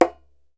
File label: DJEMBE, African Perc